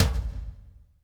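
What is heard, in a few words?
tom, real mega drums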